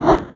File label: Low whoosh